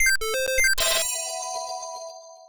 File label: notifications; RED; Hydrogen-One